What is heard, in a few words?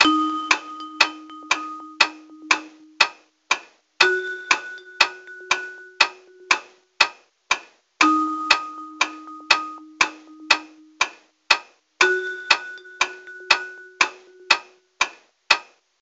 Mini Game Loop; Spielend Mathe lernen; NDS